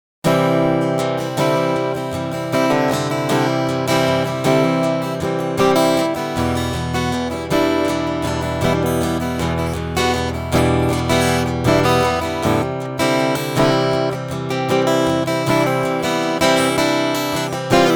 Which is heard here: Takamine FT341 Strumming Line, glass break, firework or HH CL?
Takamine FT341 Strumming Line